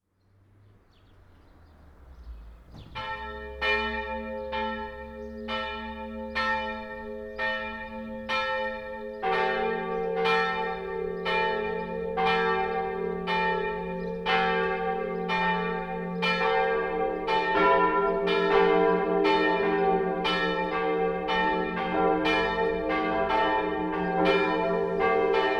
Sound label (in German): Vollgeläut, Glocke; Weslarn, St. Urbanus